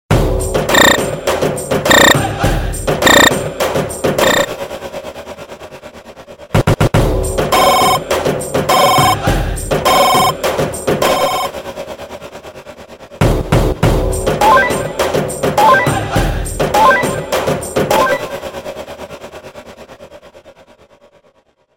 Phone Mix; Velautham

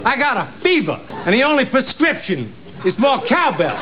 More Cowbell

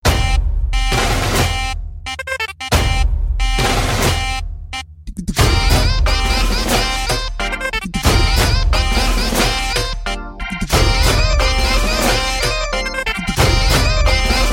Tai Tai Beat

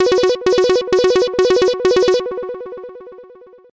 Chip Tone

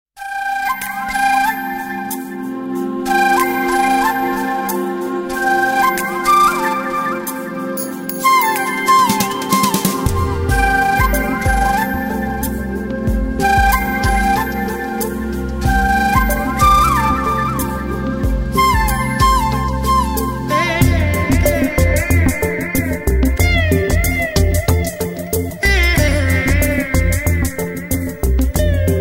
flute bird

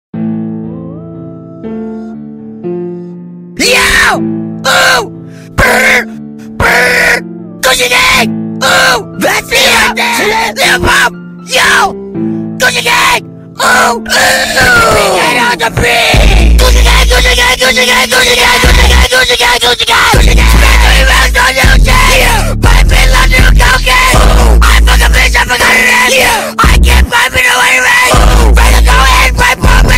Screaming

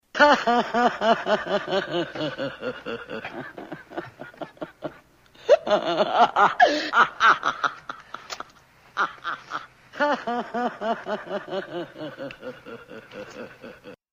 Creepy Laugh

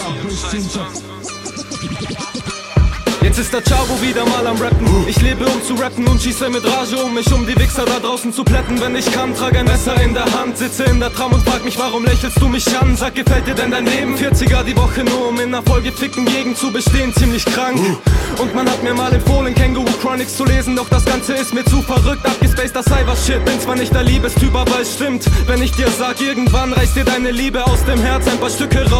German Rep

